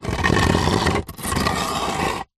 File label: concrete block small move scrape on dirty concrete